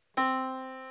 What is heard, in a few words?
Yamaha Disk, piano sound